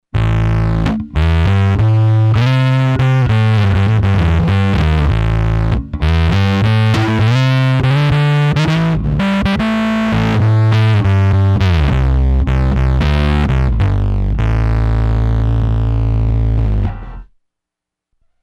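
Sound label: FUZZY DRIVE, Zoom 506 II bass effects factory preset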